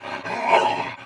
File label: throwable growl; [счастливый крик 1]